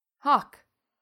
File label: hawk; bestiary; 5etools